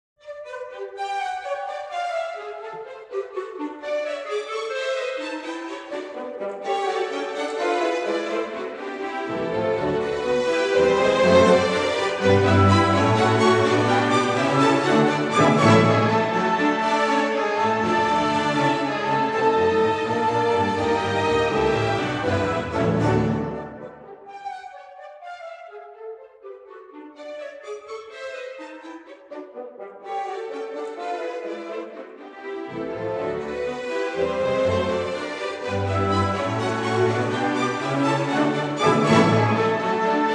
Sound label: Suite No. 4 in G Major Op. 61 Mozartiana Gigue, Orchestra